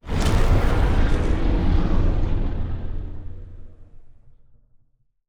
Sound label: voidworms plague; Stellaris; grand archive